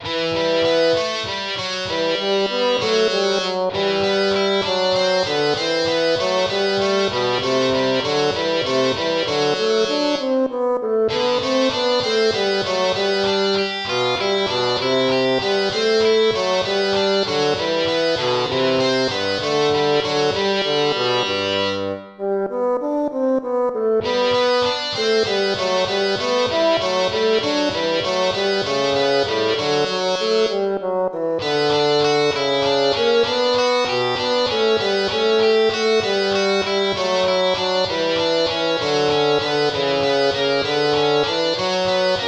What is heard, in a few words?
gigue